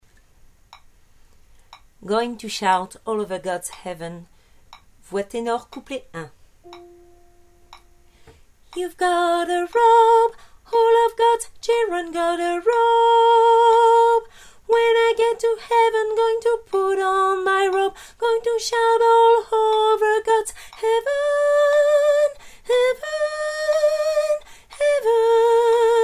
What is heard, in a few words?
tenor